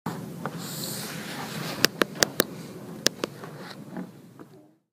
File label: homework